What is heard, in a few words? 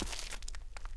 Foot Tundra; Civ4 beyond sword